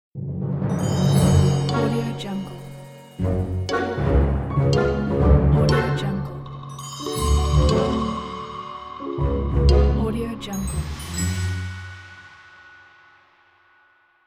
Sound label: cinematic cartoon opening ident